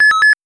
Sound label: Startup; Windows 3.0 Startup; Windows Never Released Soundpack 1